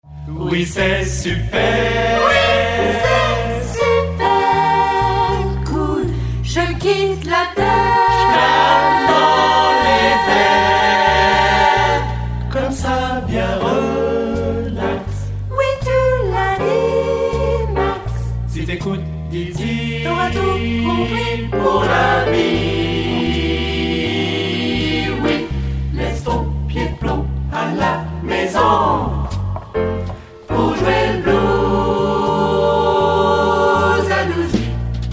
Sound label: blues 12 8